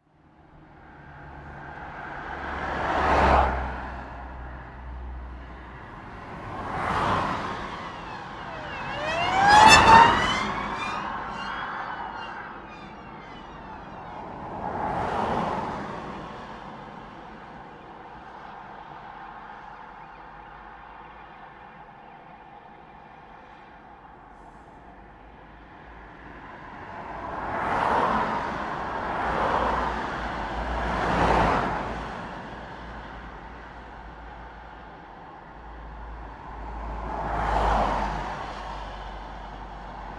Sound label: Traffic Pass Medium Speed City Streets; Variety of vehicles pass close; Traffic And Car; Tom Hutchings